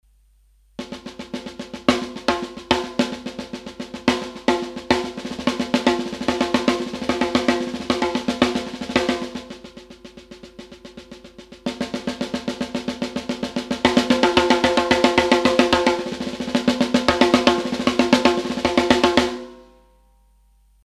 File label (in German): Snare Dynamisch; Kirchhoff Test